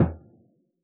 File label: surdo mute; Yamaha DJX; AnlgKit1